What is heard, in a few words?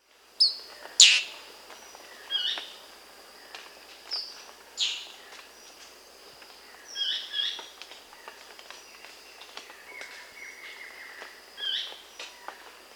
Red-headed Manakin (Pipra rubrocapilla), Bolivia; S. America